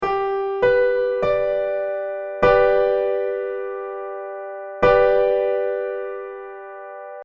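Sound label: Guitar triad G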